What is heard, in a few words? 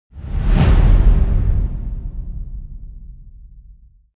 Swish